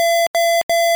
Error; Missile Command

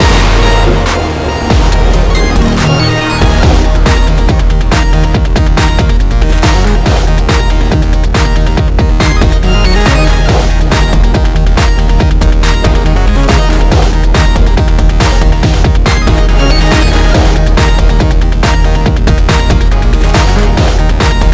MINI BOSS FIGHT MUZAC; high hell

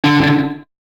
Guitar Note; curse crackers for whom the belle toils switch windows gamerip 2022

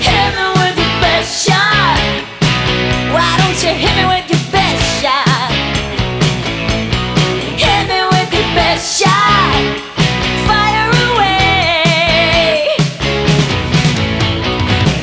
MUSIC DANCEMODE; lego dc super villains 2018 nintendo switch gamerip

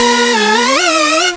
Beat

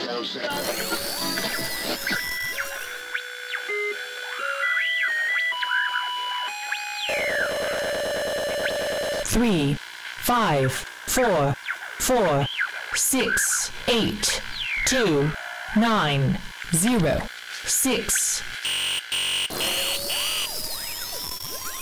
gameplay interstitial number station

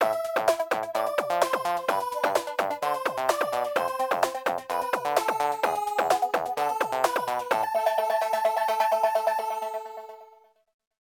cheesy synth riff

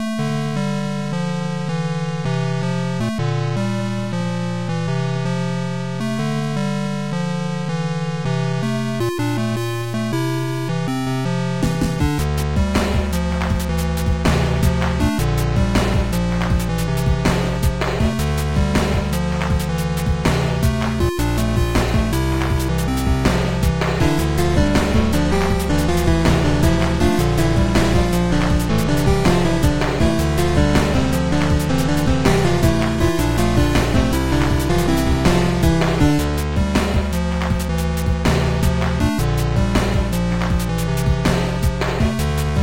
Sad Track; super puzzle platformer

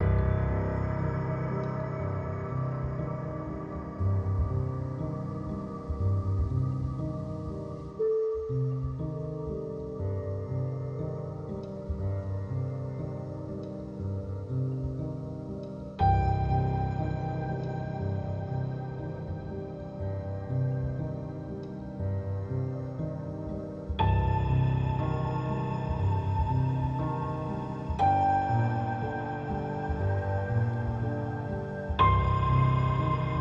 Piano; Severed

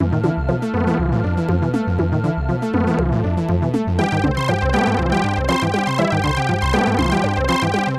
Electro

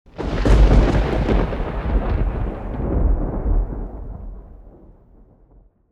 Thunder

